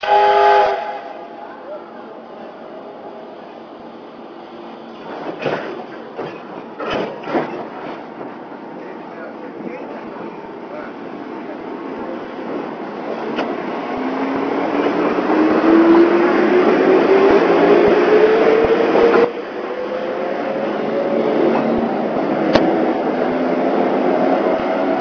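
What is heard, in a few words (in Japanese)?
大井川鐵道E101号電機 家山駅出発時の走行音; 家山駅出発時の走行音（1998年8月1日収録）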